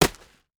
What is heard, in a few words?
Sandbag Heavy Hitting Rocks; Emergency Effects; AdobeCC